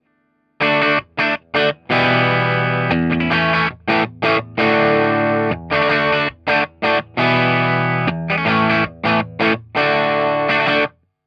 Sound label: orange std, tele